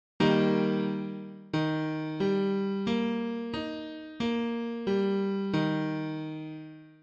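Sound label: D sharp 4 Grand Piano Triad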